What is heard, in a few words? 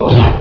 melee; butthead; shambler